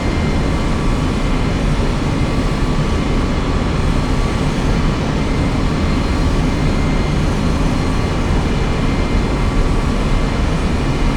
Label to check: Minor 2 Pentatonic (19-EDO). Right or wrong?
Wrong